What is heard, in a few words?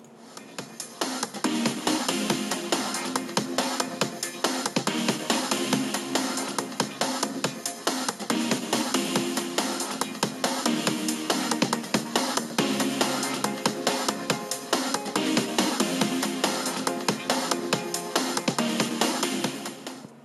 Epic Song; iPhone 6Plus